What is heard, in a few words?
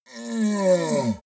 yeti help call painful; kreaturen